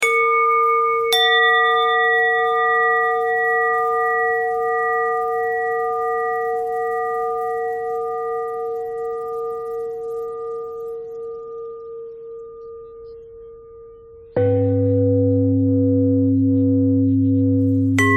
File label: bell threads